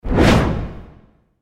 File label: wipe; Страшные звуки для монтажа